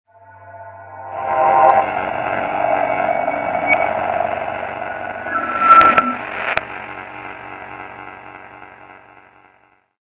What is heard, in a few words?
burer scan; STALKER; customnpcs